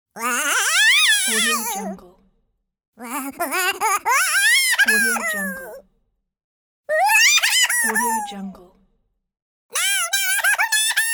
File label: cartoon voice balancing fear cries; applehillstudios